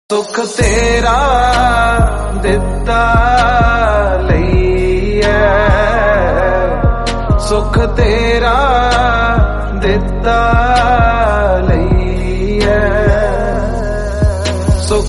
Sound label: devotional punjabi